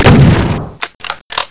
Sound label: super shotgun; weapons; doom